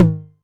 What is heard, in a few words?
Tom Hi, Novation Drumstation; Novation DrumstationV1